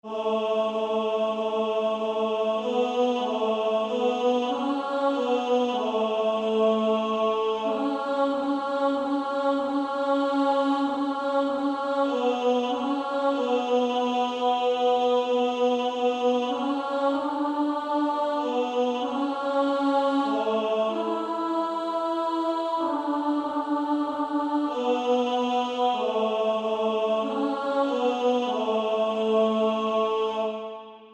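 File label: Female Choir, alto 1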